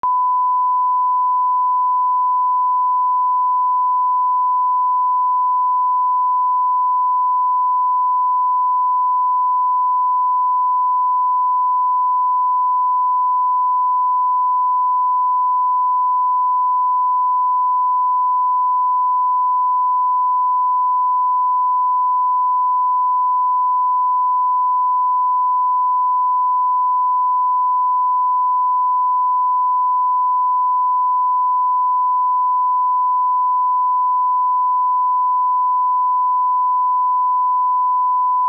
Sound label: Sine 1kHz - L; NTiAudio XL2